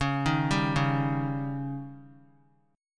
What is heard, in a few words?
C sharp Suspended 2nd Flat 5th C sharp; arpeggiated; JGuitar